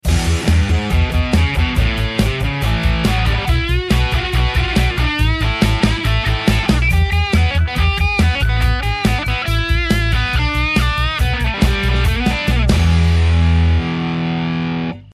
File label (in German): fuzz voll auf; MEK Classic Fuzz CF-1